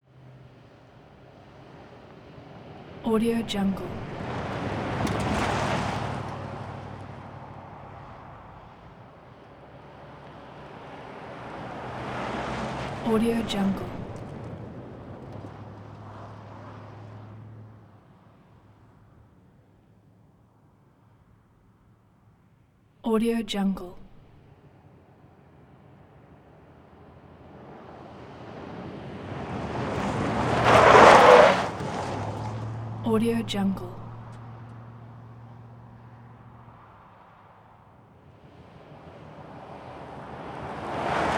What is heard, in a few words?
chevy avalanche pickup truck 20mph; 2007; prosoundfx